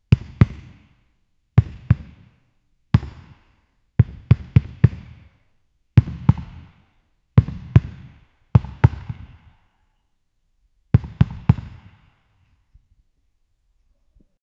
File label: basketball bouncing